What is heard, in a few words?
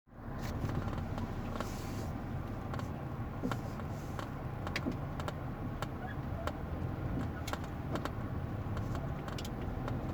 squeeking sound; x-type x400; nyikorgás